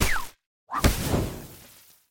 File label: crash Cactus